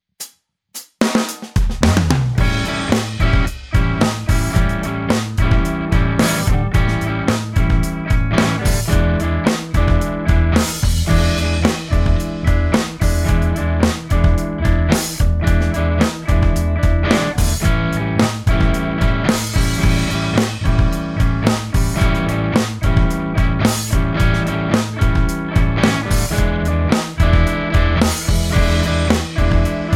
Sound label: no lead; xmas